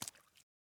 water step

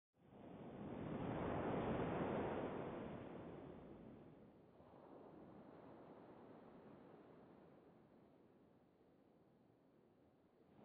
Ambient Gasroom; Star Fox Adventures; Cape Claw

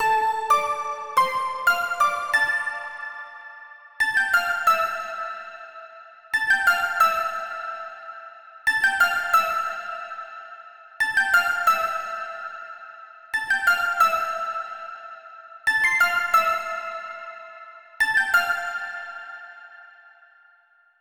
Pluck Melody

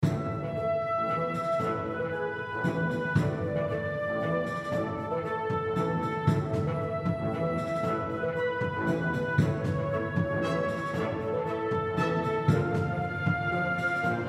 World Complete Music; Tower Climb

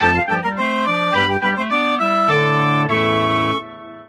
Baseball Jingle Happy

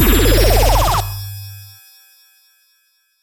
ui trophy show

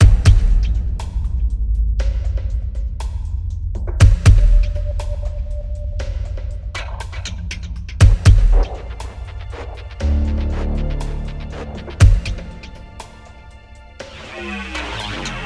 Timer

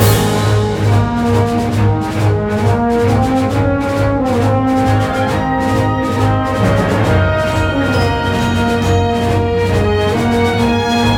P V P Victory Loop

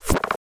piano puzzle add sound error; through abandoned the underground city

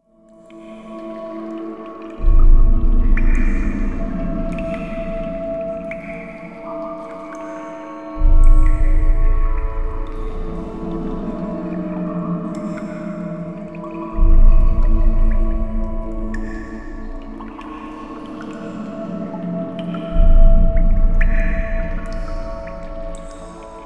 Desert Ambience; Monster Hunter; Area 5

